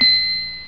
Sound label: Magic; Mosaic Magic